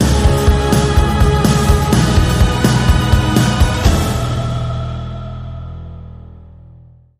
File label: Bumper